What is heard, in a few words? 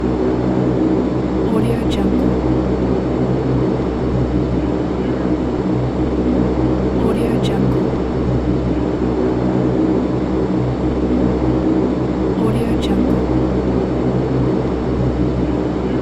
cargo airplane interior during flight; kilmermedia